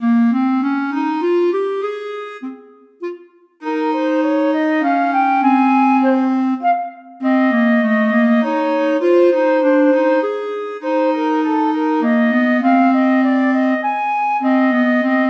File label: canon flute clarinet